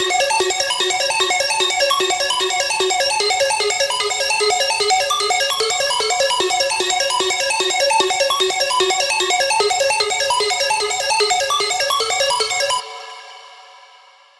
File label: Spider, hard techno; teknovault hard techno vital presets vol 2